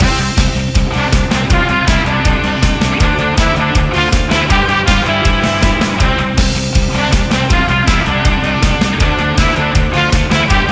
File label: Opponent Down; Minor Circuit